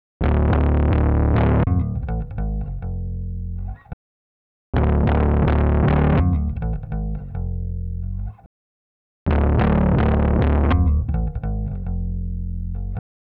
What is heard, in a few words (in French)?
basse